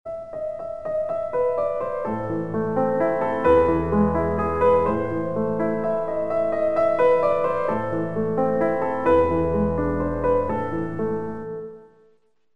klaviersound

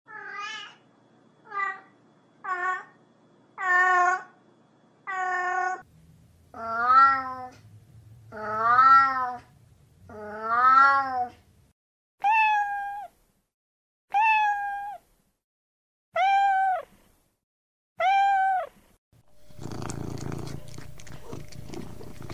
katze; haus und nutztiere erkennen

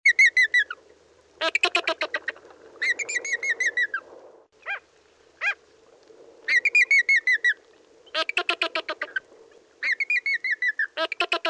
flamingo; zootiere erkennen

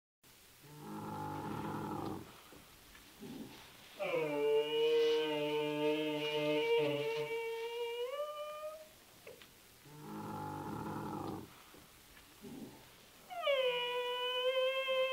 kamel; zootiere erkennen

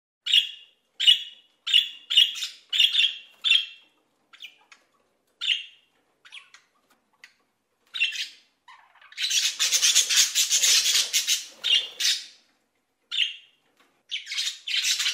wellensittich; haus und nutztiere erkennen

